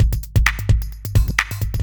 electro kit 130

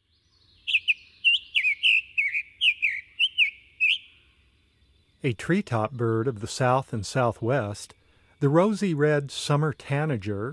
Summer Tanager; North America; Common Western Species; Know Your Bird Sounds

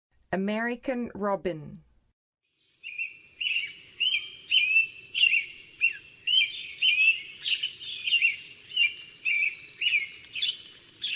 American Robin; North America; Western USA; Western USA Songbirds

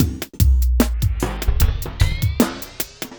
hiphop

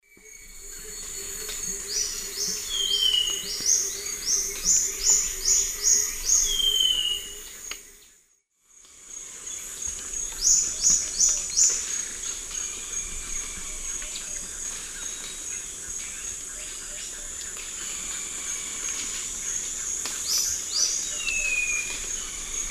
Epinecrophylla erythrura, songs 2 birds; S. America; Eastern Lowlands

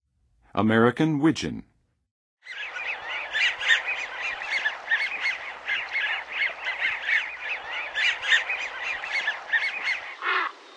American Wigeon; North America; Western USA; Western USA Songbirds

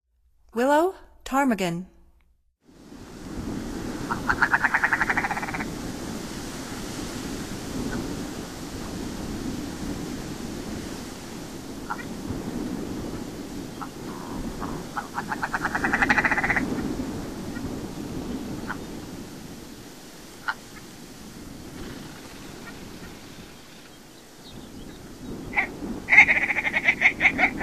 Willow Ptarmigan; North America; Stokes

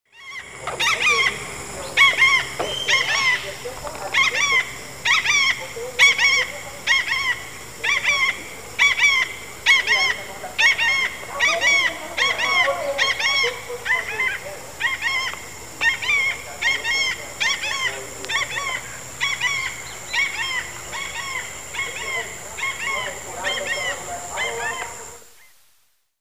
Phaetusa simplex, flight calls; S. America; Eastern Lowlands

